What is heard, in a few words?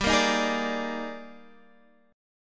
Eb Major 11th G